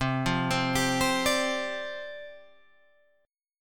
C Suspended 2nd Suspended 4th, down, Guitar, Open G